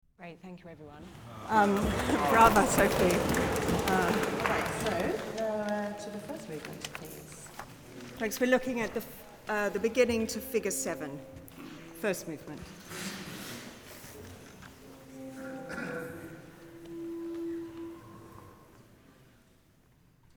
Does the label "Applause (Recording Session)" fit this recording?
Yes